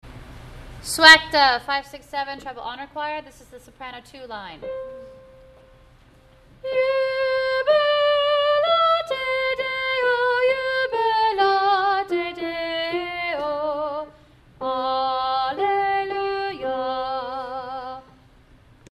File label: treble